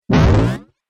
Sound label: Dubstep Audio Laser